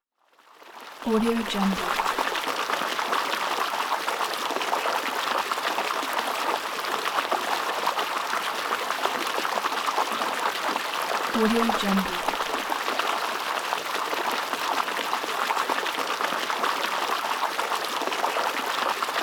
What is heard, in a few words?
water flowing